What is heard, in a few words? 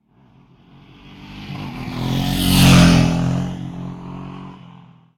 motorbike; Blind Drive